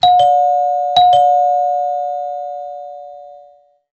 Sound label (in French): Carillon